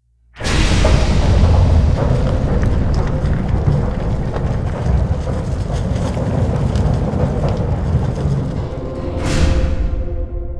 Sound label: vault door massive; ambience; Warframe; Entrati